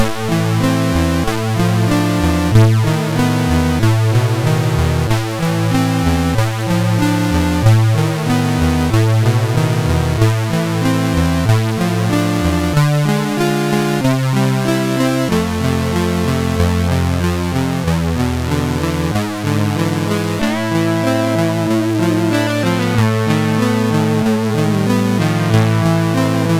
sid, MOS6581; Submerged; MOS6581R4

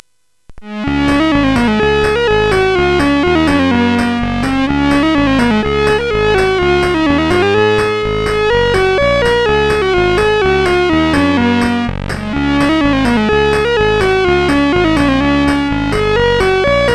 sid CSG8580R5, GAMES; Aussie Games

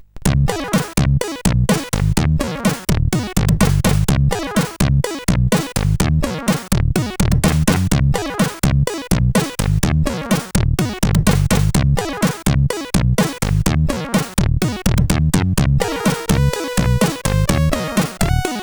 Bum CyCk Cyk, sid CSG8580R5

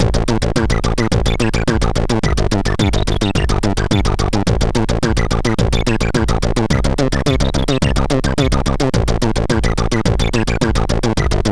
Santa Lusia, MOS6581R3; Spandex

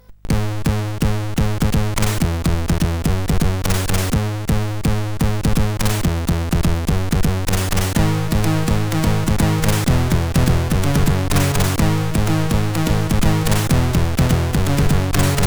sid CSG8580R5

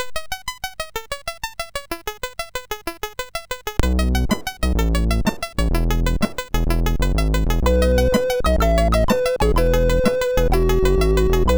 MOS6581R2 sid

